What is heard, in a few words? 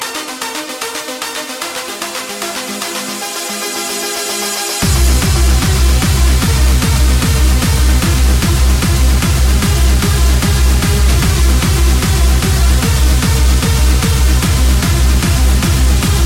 hard core vibes